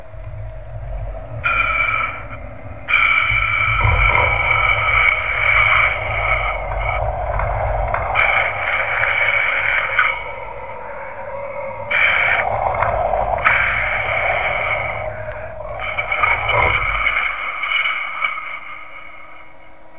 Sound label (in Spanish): monster con soplando bombilla